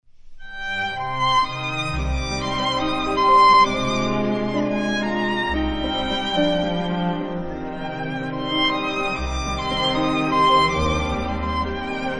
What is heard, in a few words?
soothing mobile ringtone